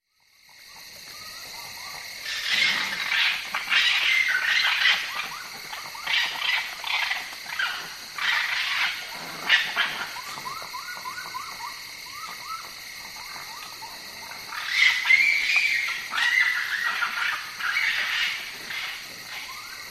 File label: northern raccoon chittering; lang elliott